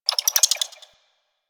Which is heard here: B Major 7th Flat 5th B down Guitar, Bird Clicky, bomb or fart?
Bird Clicky